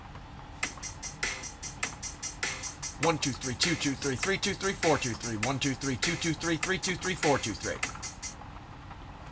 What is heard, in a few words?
triplet, drill rhythmic notation